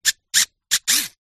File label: science fiction robot motor server movements